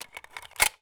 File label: mag in; Escape from Tarkov